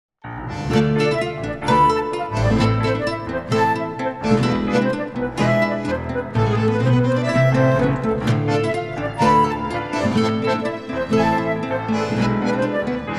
A play flute reference

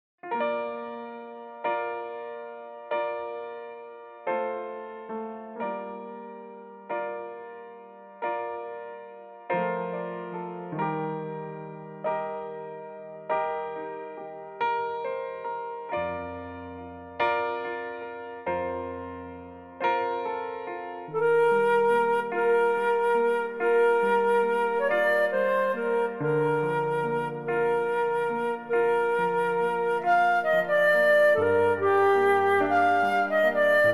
flute

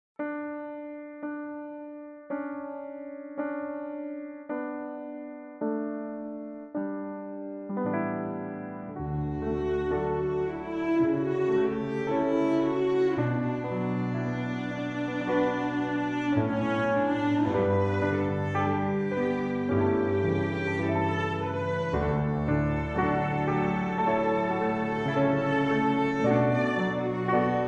D

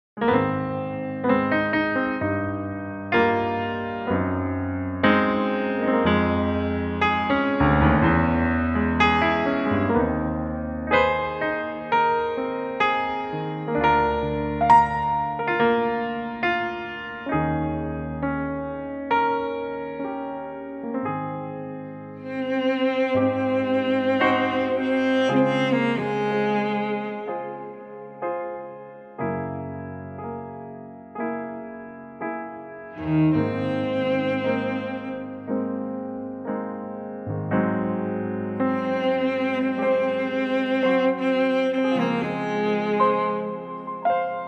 cover cello reference

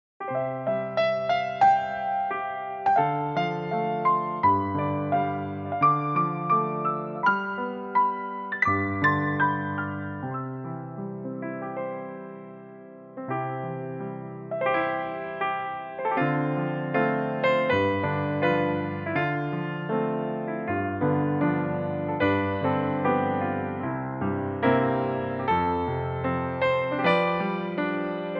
piano play

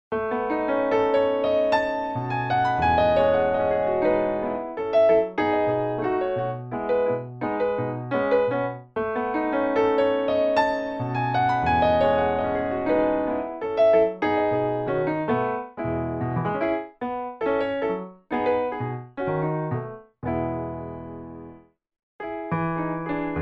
piano solo play

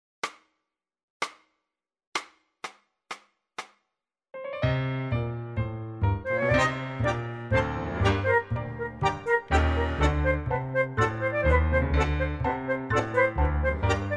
flute, Gm

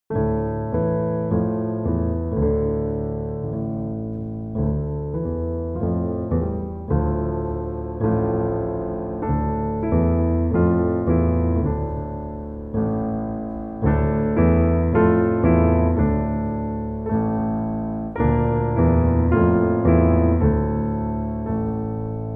piano play

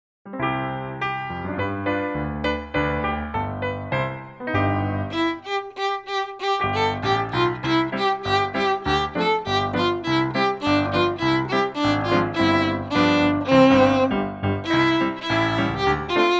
piano play violin

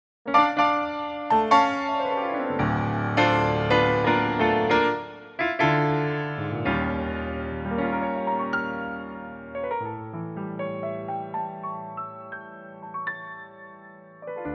without violin intro

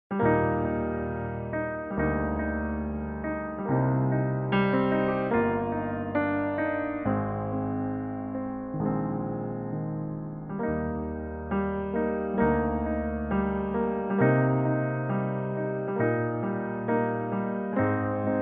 piano cover

